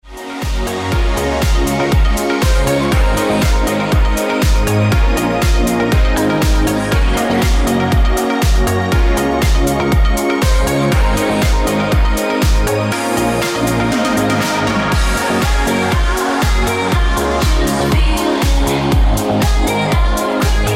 Original Mix Ringtone

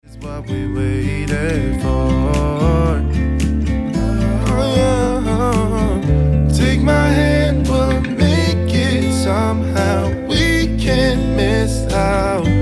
Acoustic Version Ringtone

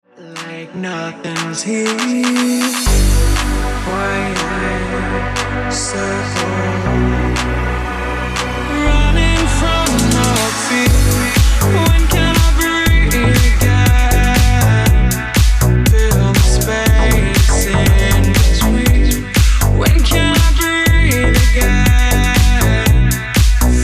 Original Mix Ringtone

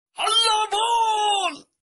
Halla Bol

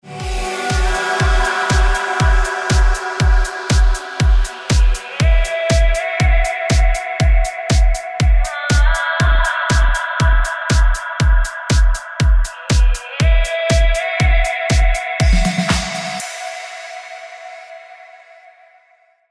Ringtone

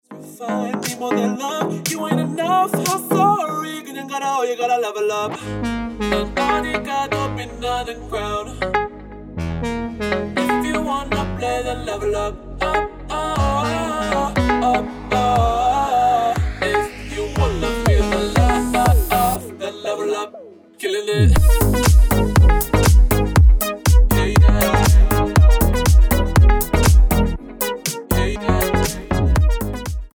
Original Mix Ringtone

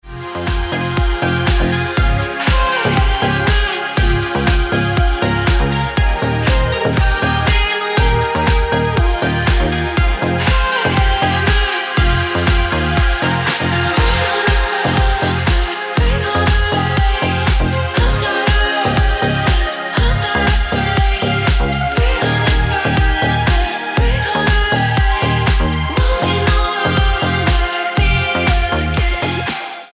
Ringtone

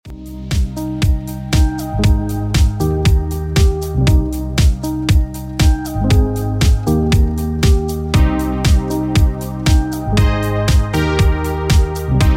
Ringtone

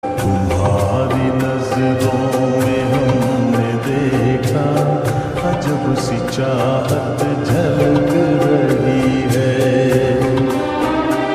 Lofi Ringtone

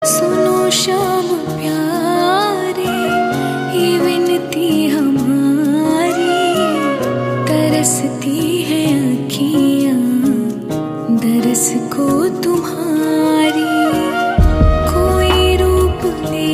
Bhakti Song Ringtone

